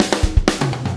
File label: OH BABY